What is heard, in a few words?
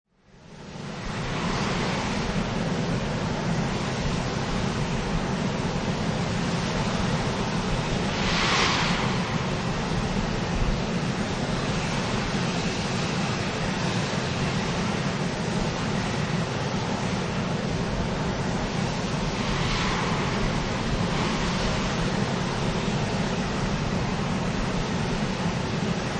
White Island volcano: From one of New Zealands active volcanoes; Natural lava vents emitting hot steam (no running lava; Environmental Sound Effects; LynneMusic